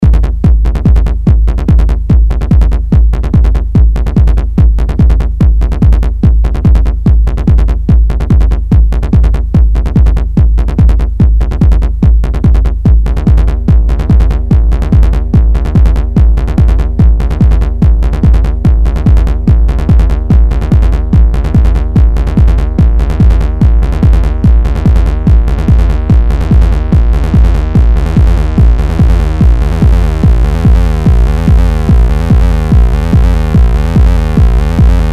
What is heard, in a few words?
TS303 vs Moog Prodigy